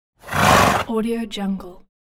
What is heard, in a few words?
horse snort; chicasound